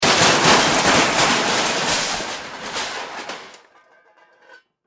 Impact Crash Metal; Materials

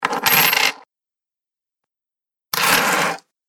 Coins Two Sounds, money sound effects

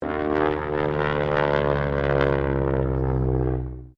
AIRCRAFT AT-6D HARVARD MK III PASS

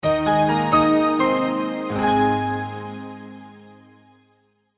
multimedia logo, Musical Identity/Signature; Short Musical Signature / Ident; Sonic Logos; Sound Design; McKinney Sound

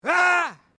MALE SCREAM MID; Male Vocal sounds; Screaming (Sound Effects:Voice)

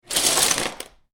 Lego Rummaging In Lego Box; Miscellaneous Toy Sound Effects; Lynne Music

